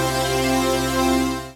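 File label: synth dance; Best Service Gigapack 1; SYN 06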